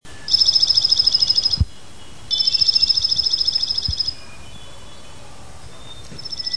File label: Dark-eyed Junco